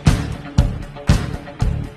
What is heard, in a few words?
wall printer